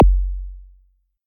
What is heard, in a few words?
ambient bass drum one shot F# key